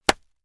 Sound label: plastic bag burst small pad